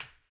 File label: HAMBONE, Vocal Blues Soul; Spectrasonics; Planet